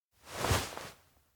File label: look up adult; aino